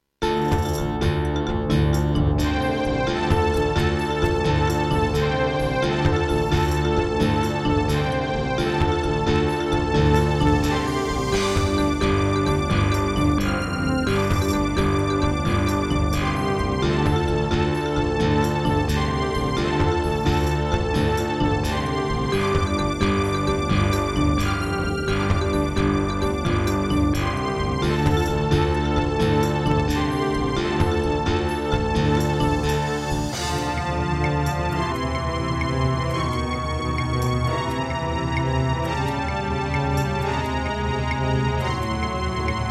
Gravis UltraSound 1MB Rev.3.4; Heretic

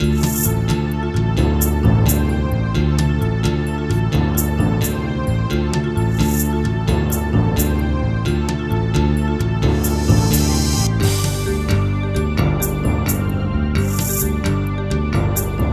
Yamaha DD-55; Heretic

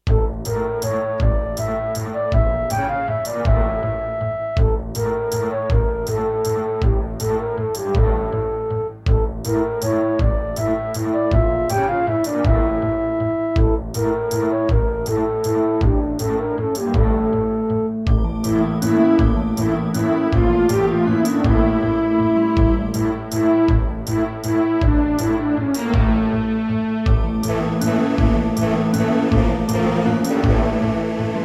Gravis UltraSound; 1MB Rev.3.4

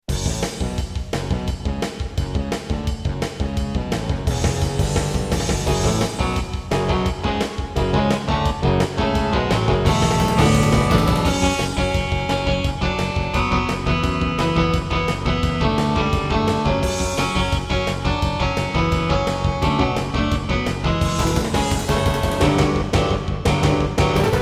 Intro, Korg NS5R; Duke Nukem 3D

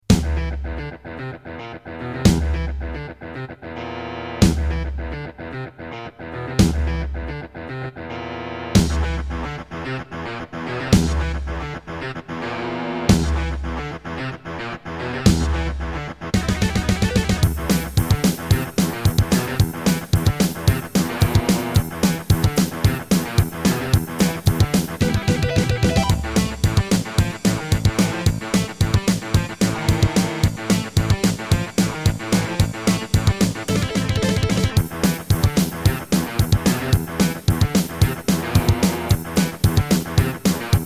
Terratec MiniWaveSystem WT64; Doom - E1M1

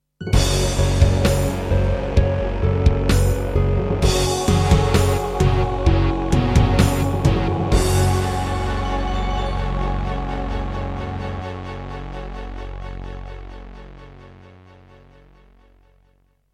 Roland SC-8820 88MAP; Doom (Sigil); Introduction

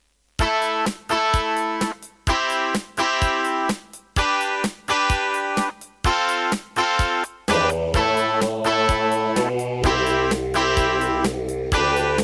Roland SD-50 GSMODE; raptor call of the shadows

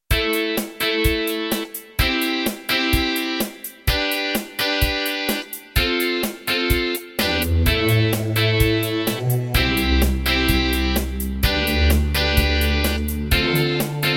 Miditemp DoX-1; raptor call of the shadows

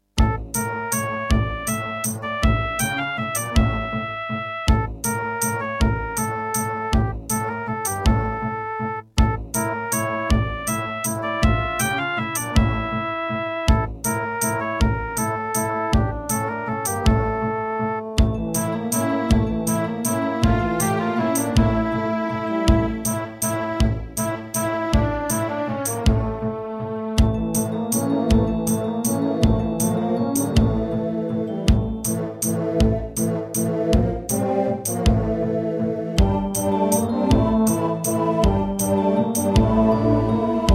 Aztech CS9233 DB

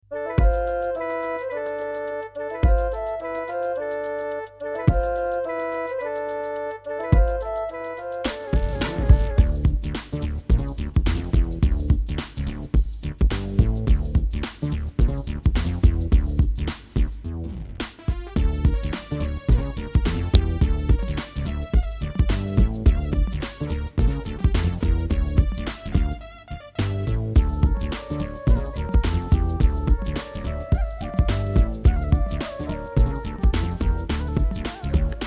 Yamaha DB50XG GSMODE

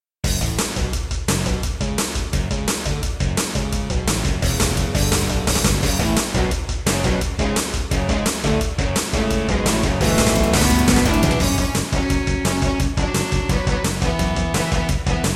Yamaha MU500 GSMODE; Duke Nukem 3D

